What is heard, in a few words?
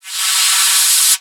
Ocean Steam Blast; Ambience; Warframe; Grineer Ocean